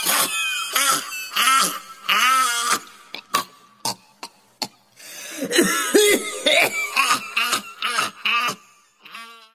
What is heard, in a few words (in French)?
rire